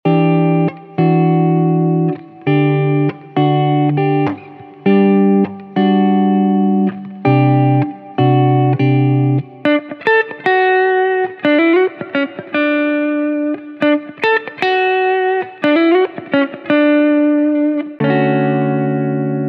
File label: golden guitars